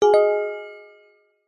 multimedia notification mallet generic with slightly harsh resonating tone; zapsplat